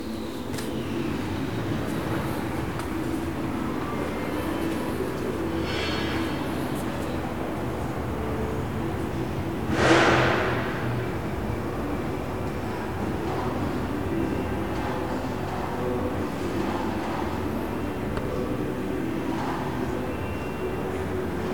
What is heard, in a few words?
Courtyard home